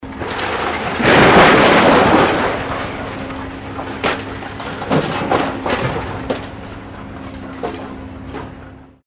scrap metal loading

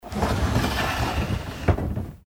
door sliding japanese screen shoji close

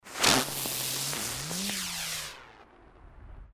fireworks spinner

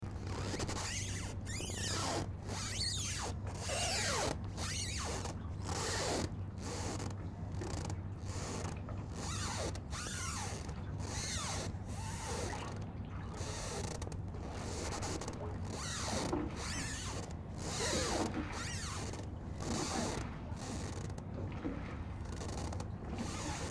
boat moorings rubber squeaking loop

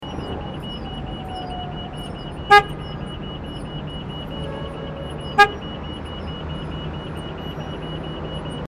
baggage trolley

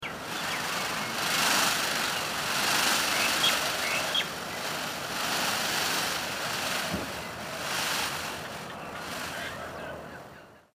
bird peacock tail shimmer

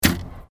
archery hunting bow firing

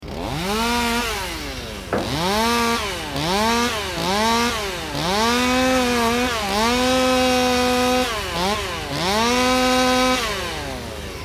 chainsaw operating

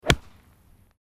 golf drive iron chunky

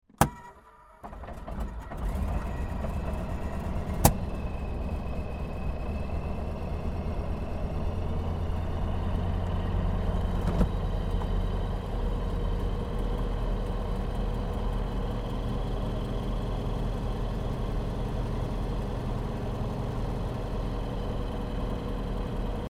aircraft yakovlev yak-52 startup interior

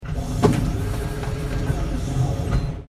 door automatic servo driven open long stereo

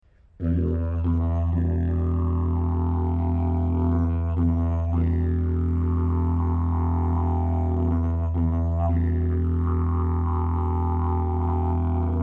didgeridoo 3 part slide didge drone

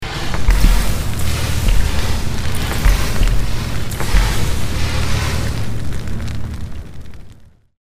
created magic spell meteor shower stereo

